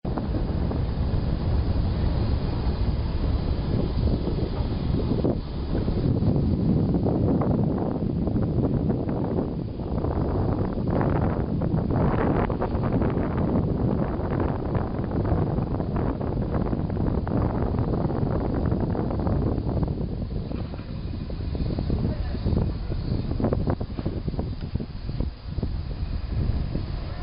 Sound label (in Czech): pohled na Kobe; japan